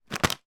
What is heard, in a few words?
food crisps potato chips packet throw down on surface; zapsplat